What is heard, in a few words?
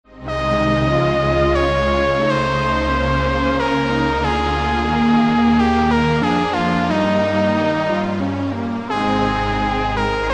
trumpet, Switched On Doepfer; Trumpet Concerto II; Haydn